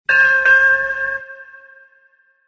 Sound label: bell